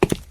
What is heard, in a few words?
walk